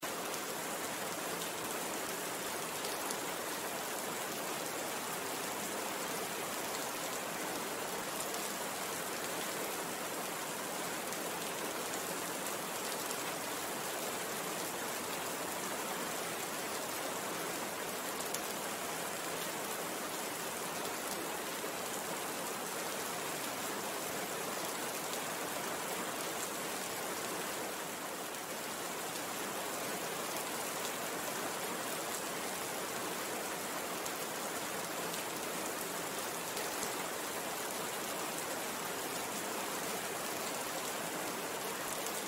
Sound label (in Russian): dogd bez groma; шумом дождя, падающих капель